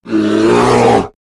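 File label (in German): bär brüllen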